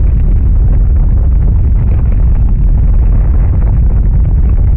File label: Quake Rumble Loop; SFX Kit; Destruction